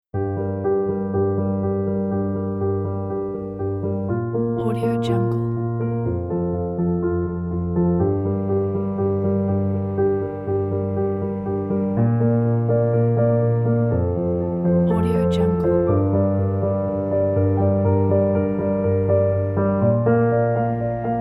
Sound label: soft reflective piano and strings; mylesmusic